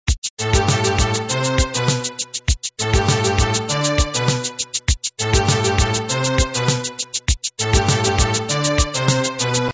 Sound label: Polyphonic Ringtones; WWE; Booker T